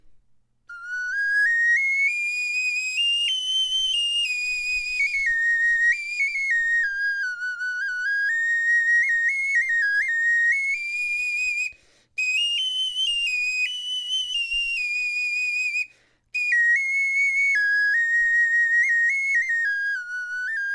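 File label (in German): impro, Knochenflöten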